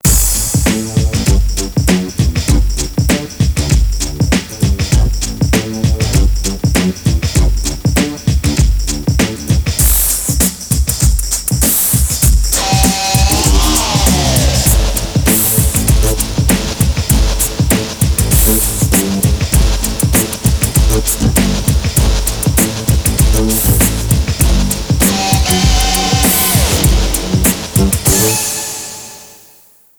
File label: rock